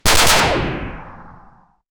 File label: a shot from a heavy, explosives